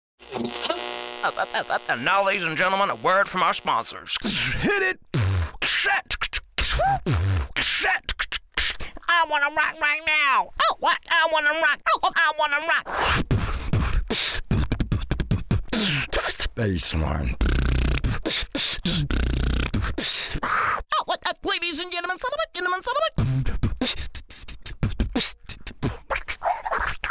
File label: Beatbox